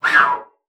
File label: JET WHIS C2; Xsample VOL-2